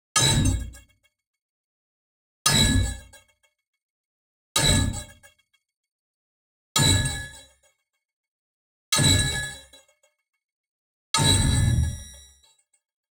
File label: neon hit small stutter neutrons; boom library